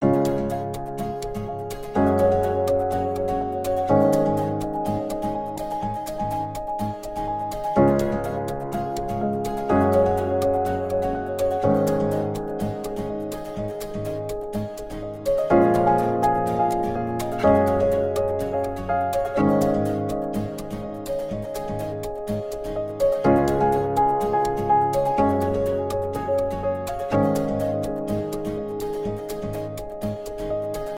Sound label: Happy Piano With Guita; Storytelling-Essentials; Happy Piano With Guitar